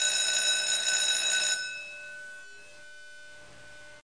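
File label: school bell; skolbell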